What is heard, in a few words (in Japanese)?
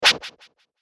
回避